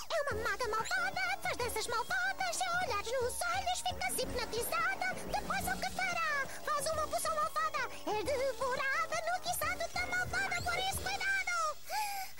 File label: (European Portuguese); Season 1